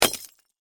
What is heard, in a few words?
Warfare, Bullet, Impact, Glass; Combat